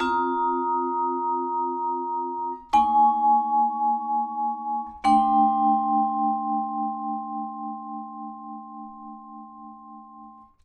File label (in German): Vibraphon 90 bpm F